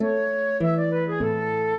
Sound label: minuet, flute harp; Mozart